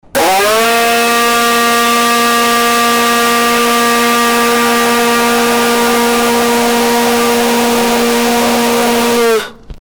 long shofar blow loud

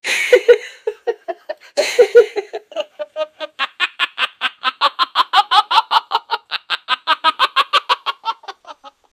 9 year old african am, laughing

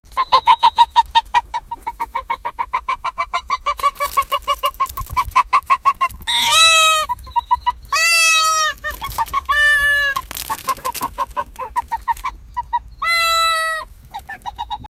chickens quarrel, fighting; 2 chickens

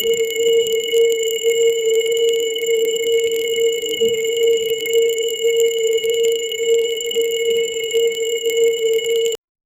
short rpg damage over tim

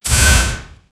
mechanical sound, industrial

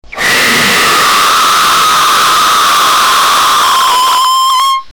loud defecate sound, gross

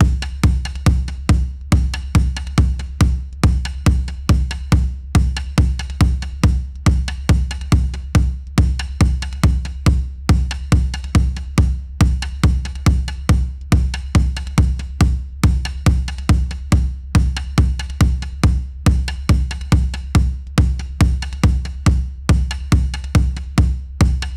church and industrial bel, 140 bpm

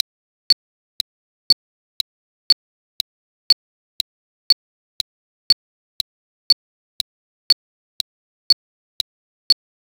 exciting modern countdown

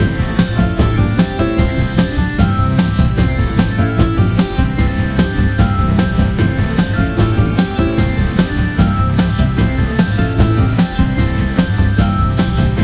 advertising music

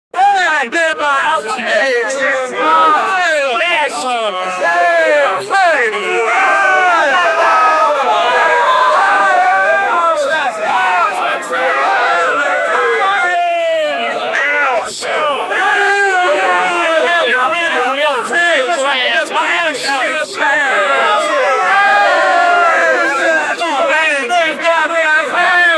goblins at a, party; 5000 goblins at a fbi

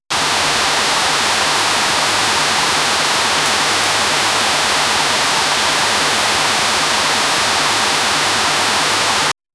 rain in a, eerie

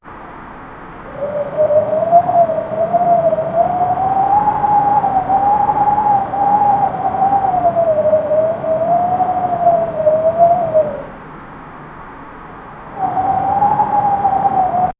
ambience with fog, chilling

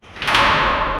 sliding metal door heavy; laboratory

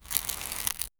plant growing; for video

